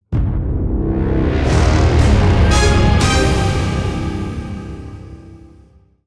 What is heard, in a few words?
Music Jackpot Intro Splash; godsoffortune